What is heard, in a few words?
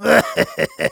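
laugh evil, German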